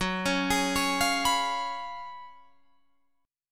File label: Gb Major 7th Suspended 2nd Suspended 4th, down, Guitar, Drop D